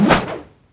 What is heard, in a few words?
Bird Shot; angry birds rio